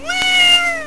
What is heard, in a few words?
cat big